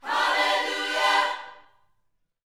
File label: Vocal, Gospel, PHRASE; Spectrasonics